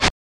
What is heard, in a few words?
Enter Sound; Game Genie; SNES